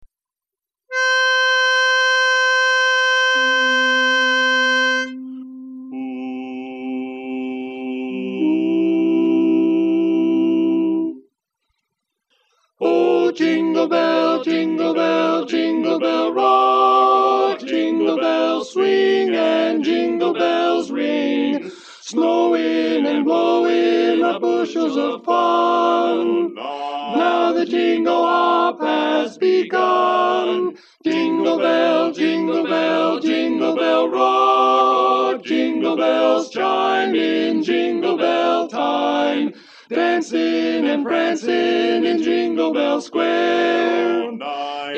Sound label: tenor; Christmas